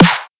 drum snare death combo; Virtual Riot; Drum Hits

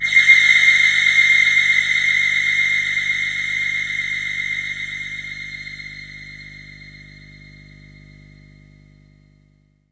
EFFECT, Explosive Jungle

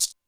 Lo Maracas, Alesis D4 drum machine

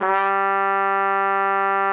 TRUMPET G3; Xsample VOL-3

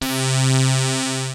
RING SAW, poly 800; TECHNO-BWARE

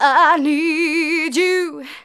Female vocals and robo-vox

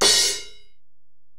Ride, Alesis DM-PRO

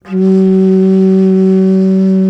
BASS BONS, India Winds; E-MU Producer Series Vol. 7 – Old World Instruments

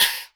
Elektron Monomachine, Drum Machines

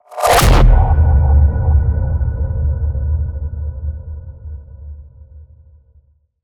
impact shut; Virtual Riot

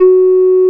OB8 blow F#3, OBERHEIM OB8; Big Fish Audio; First Call Vintage Keyboards

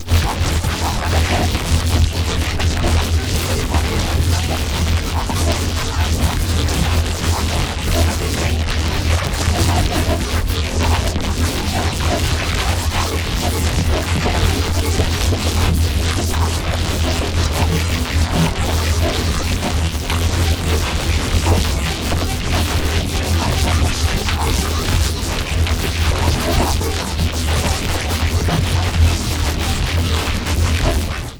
Bass Granulize E1

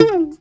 SHUR NOTE, TOPSHUR NT

